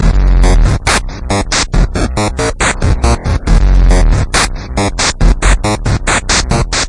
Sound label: big Drop